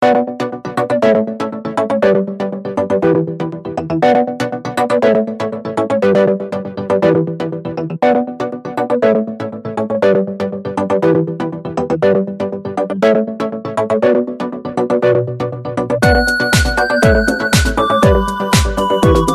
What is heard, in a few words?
Techno; pulsating rythm